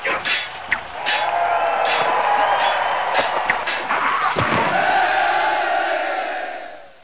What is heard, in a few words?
Hippodrome; Caesar3